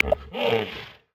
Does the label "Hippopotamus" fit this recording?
Yes